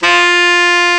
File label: SAX F3; AKAI S-Series CD-ROM Sound Library VOL-8